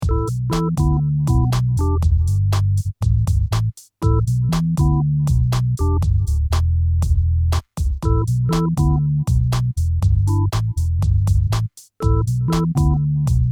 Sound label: Beat